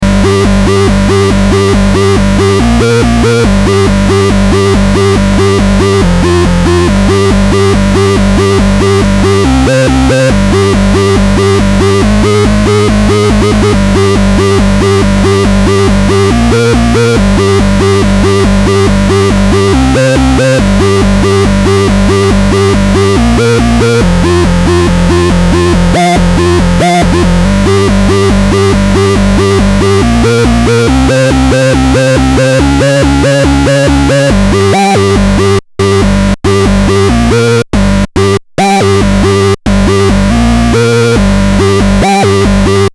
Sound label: Rough Lead; Roland SE-02